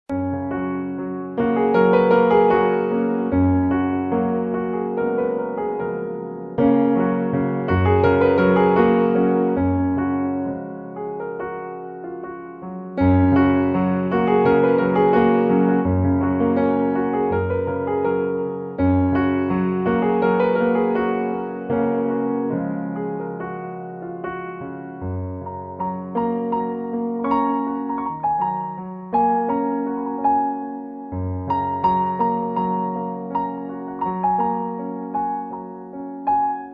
Piano

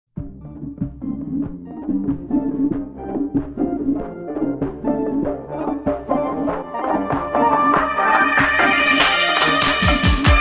Accordion

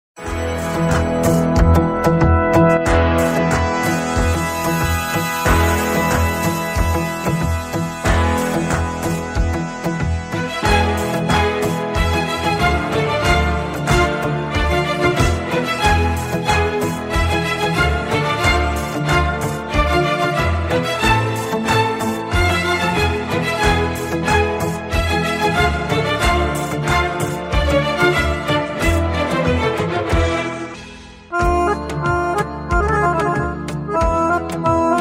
Accordion

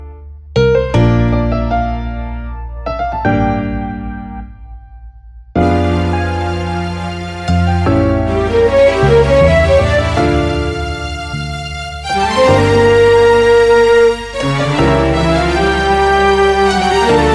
Clarinet

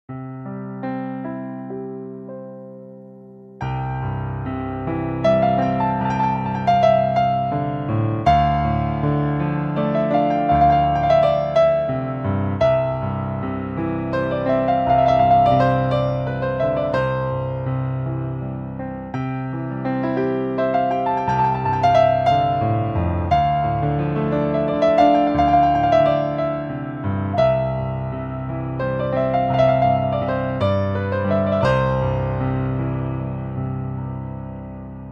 Piano

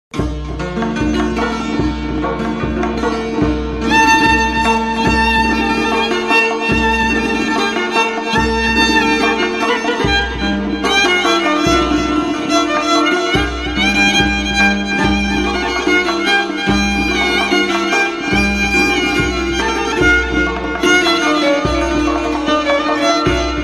Violin

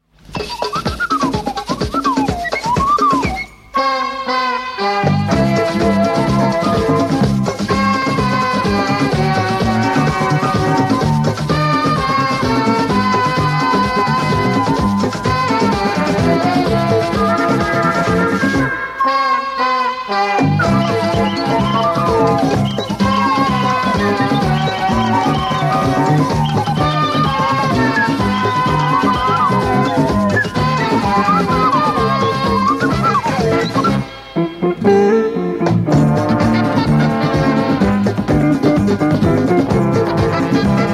Melody

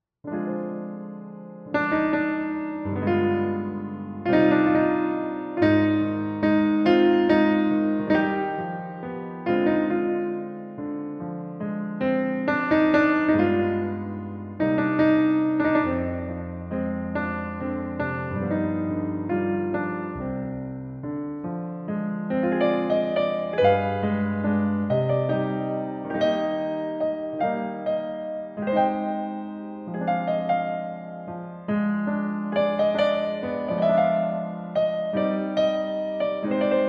Piano

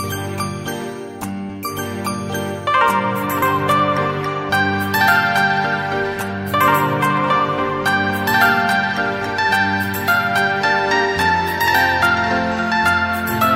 Melody

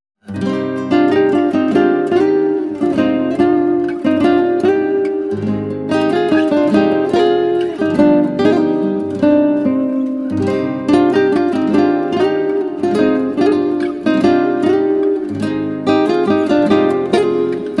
Guitar

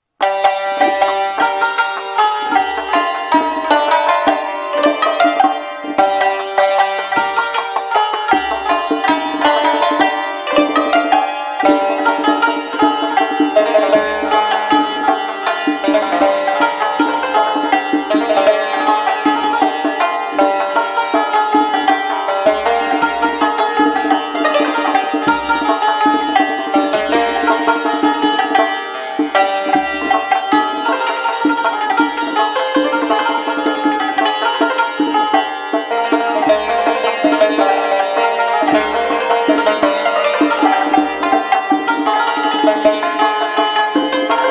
Santur Tonbak, play back

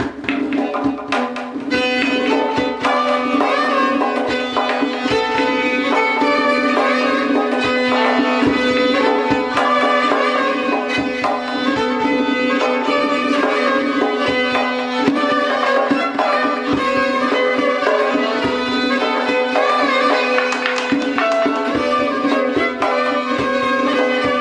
Violon

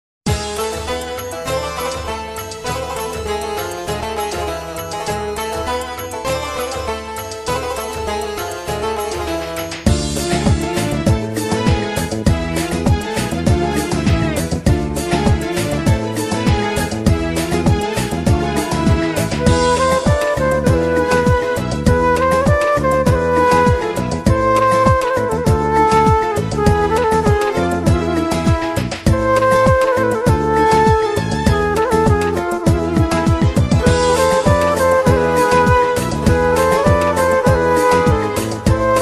Flute

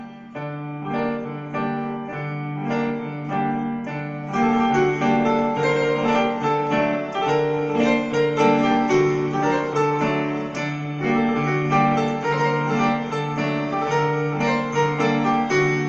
Piano

